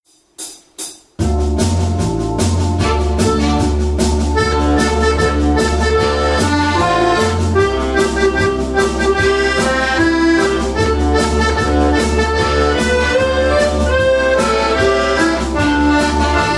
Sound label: Drunken sailor tune